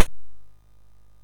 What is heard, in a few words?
sid MOS6581R3; Rescue on Fractalus